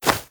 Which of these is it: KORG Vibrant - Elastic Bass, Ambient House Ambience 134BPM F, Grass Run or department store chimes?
Grass Run